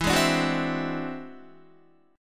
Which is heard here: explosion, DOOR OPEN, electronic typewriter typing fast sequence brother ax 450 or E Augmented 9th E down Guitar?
E Augmented 9th E down Guitar